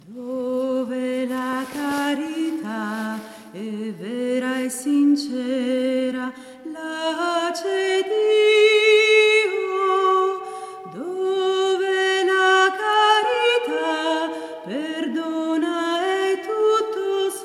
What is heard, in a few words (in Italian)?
Dove la carit SOPRANI; ARCIDIOCESI DI SANT’ANGELO DEI LOMBARDI-CONZA-NUSCO-BISACCIA